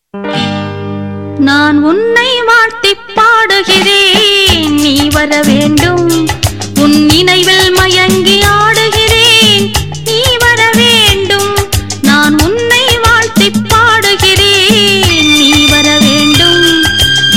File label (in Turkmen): turkmen melodisi, ringtone